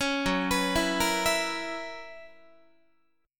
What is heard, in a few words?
Gb 11th, Guitar